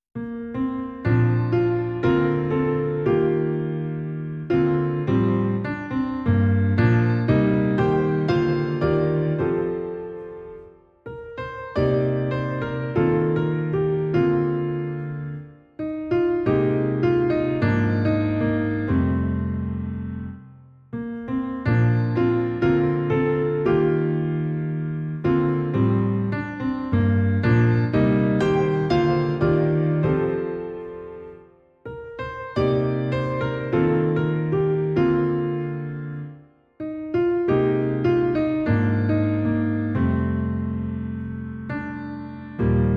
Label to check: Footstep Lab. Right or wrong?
Wrong